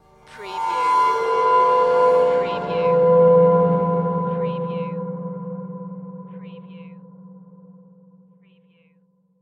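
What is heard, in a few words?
Production Element Feedback; Sound Effects Bible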